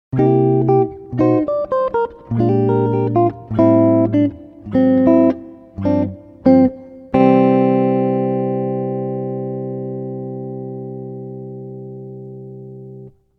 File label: lick